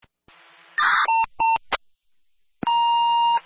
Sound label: Standard Tone